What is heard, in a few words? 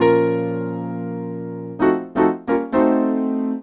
Piano, Acoustic Pianos